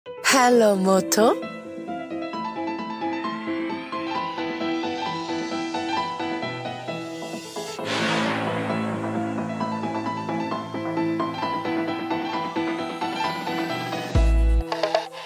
Motorola Moto E22

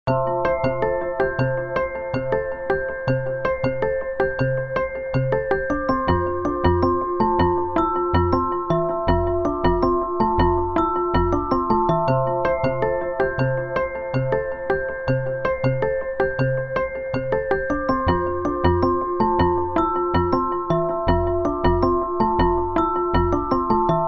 iPhone Scavenger, sonnerie

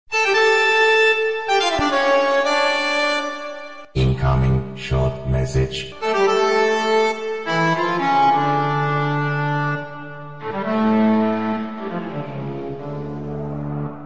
Incoming Short Msg

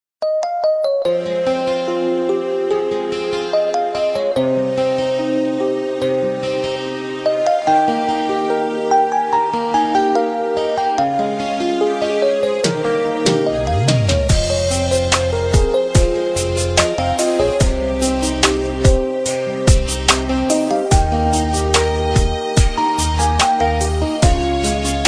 Arabic Ring Songs

